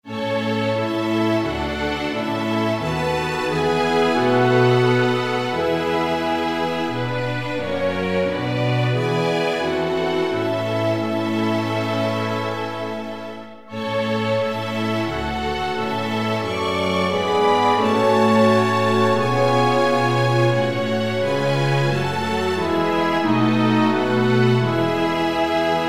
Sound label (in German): kammerorchester